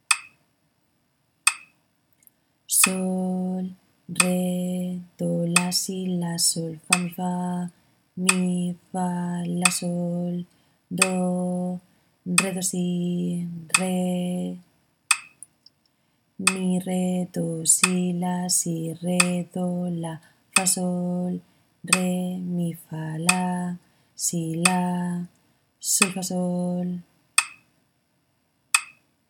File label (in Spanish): ritmo 6-4